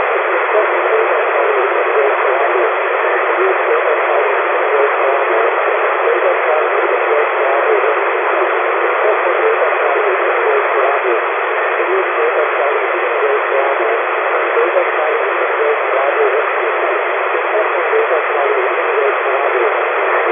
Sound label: SSB -130dBm + DNR; SN-Vergleich